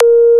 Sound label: EERIE, TECHNO INDUS; Greytsounds VOL-1